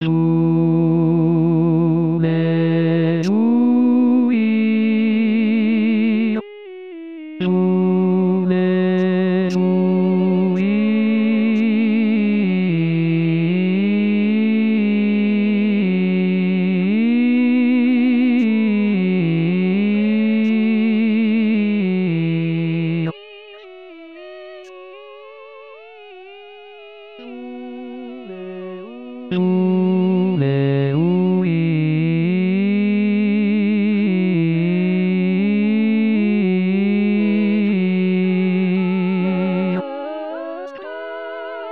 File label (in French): basse